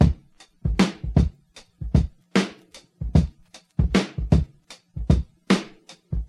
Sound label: 76 bpm breakbeat sample c sharp key, kick tuned to the C# note